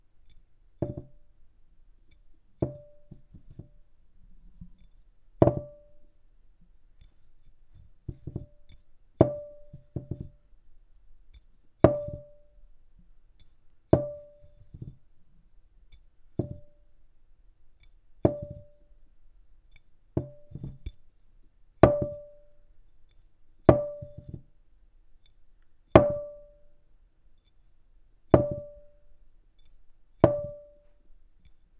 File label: Jar On Table